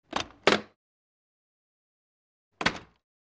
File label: Freezer Door Opens Cl